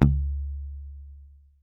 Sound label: YAM MUTE C#2, Bass; Masterbits CLIMAX 7; PATITUCCI BS